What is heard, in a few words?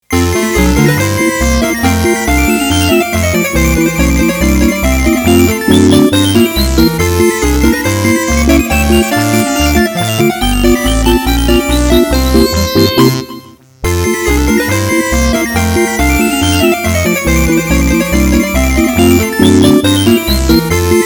8 bit march